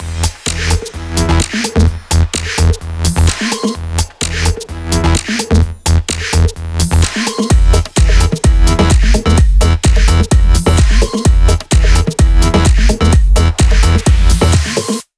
128BPM Mix; Vengeance Samples; Destination Kit

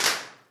Claps; Vengeance Samples

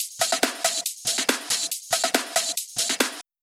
Loop 140BPM, Drums & Perc; Vengeance Samples; Selection Kit; E minor

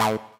303 Acid A; Vengeance Samples

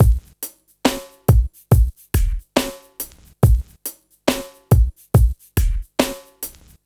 Slomo Beat 140 BPM, Loops, Slow Motion Beats; Vengeance Samples

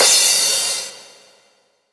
Crash, Cymbals; Vengeance Samples; VEH2

